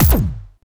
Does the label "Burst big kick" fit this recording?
Yes